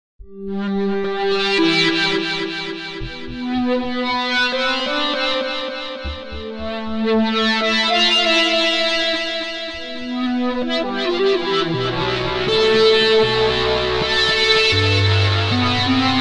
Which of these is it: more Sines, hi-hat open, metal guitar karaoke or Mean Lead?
Mean Lead